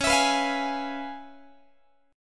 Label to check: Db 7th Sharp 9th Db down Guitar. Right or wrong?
Right